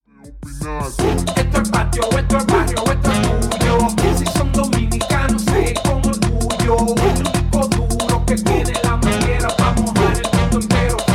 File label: latin explosion; multitrax